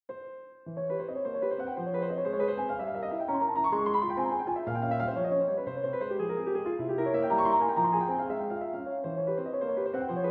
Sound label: Etude in F minor; Etude Op. 25 No. 2; The Bees